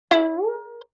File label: Violin Pluck Pitch Rise; Vintage Cartoon; Musical Elements